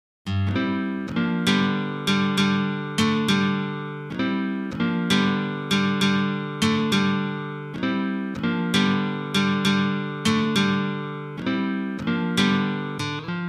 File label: Chicago Style Blues in Open G Rhythm